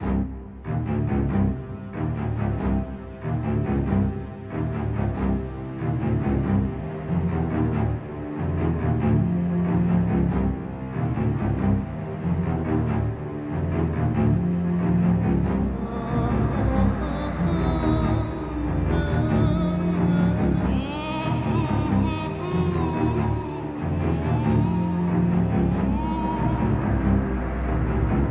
Action 140bpm; project zomboid

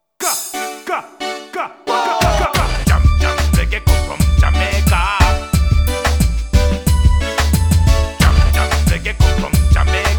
reggae FUNKY JAM COOKIE MIX; beatmania THE BEST PROMINENT

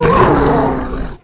Monster; Conquer Online 2.0

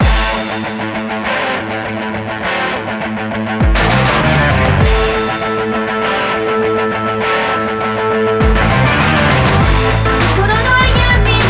Reset Vocal; Shin Megami Tensei Devil Survivor

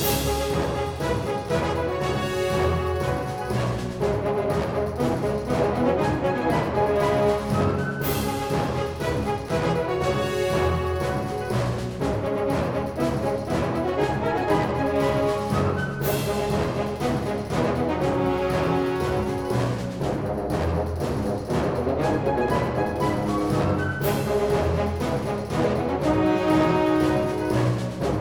Music Fight Theme Average 120bpm Loop; asterix obelix slap them all 2; Romans

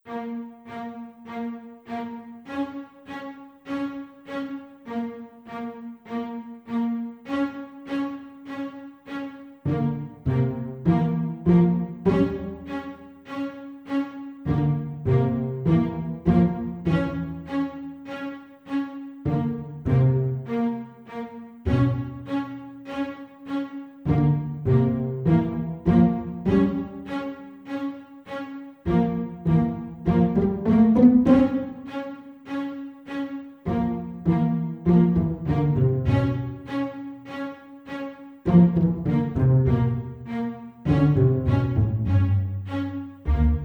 house of cards dungeon

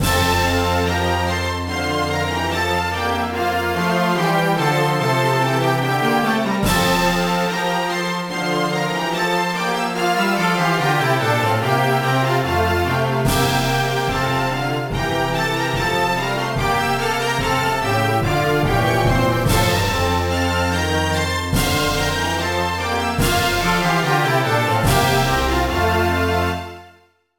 BGM ORCHESTRA

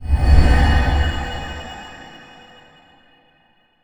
transform; yamiko; Aragami

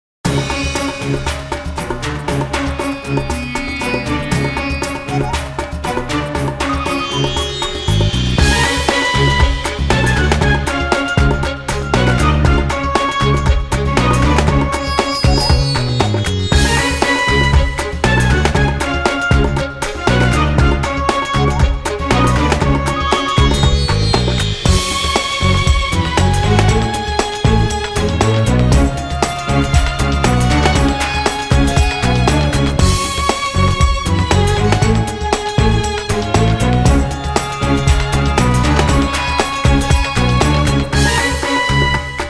Trap; Dungeon Crusaderz 2 Eigou no Rakudo Original Soundtrack

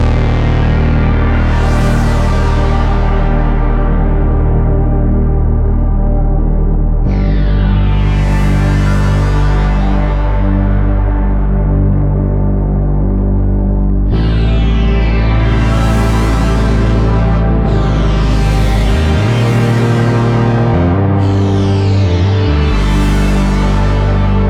City Ruins Stem; Epitasis

